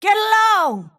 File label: move pain; snapfire